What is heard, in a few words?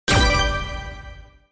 Box Open